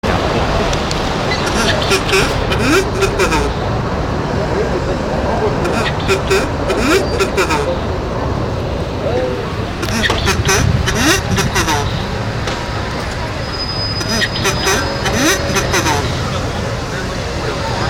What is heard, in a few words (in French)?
feu sonore paris